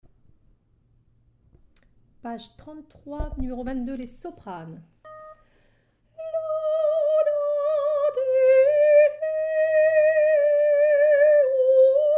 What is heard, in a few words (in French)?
psaume Soprano